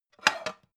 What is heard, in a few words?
pan take slide short; objekte; topf